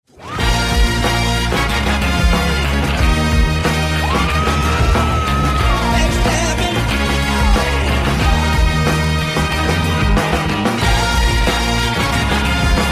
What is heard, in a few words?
bells